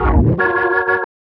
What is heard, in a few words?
organ F#, vocal house; PS-28 Vocal House Samples; organs